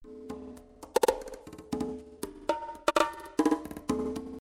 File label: Congas Live